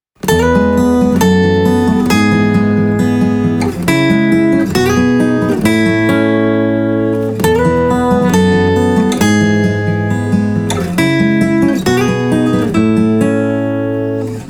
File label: BGM Camp 135 Full